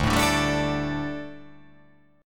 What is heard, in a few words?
D Suspended 4th D, down